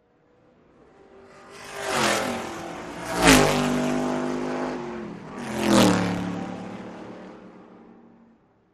Vintage Race Car; By ( 3 Car ), Vintage Race Cars Cu Fast Bys R / L, Shot Cu From The Track; 1963-1966 Vintage Race Car